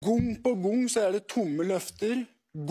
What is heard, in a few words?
gong; gong pa gong